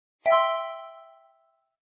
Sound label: drop props